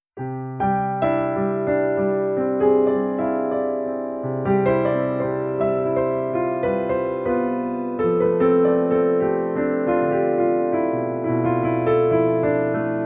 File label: mod snippet semi impro, piano; southpark north queensland australia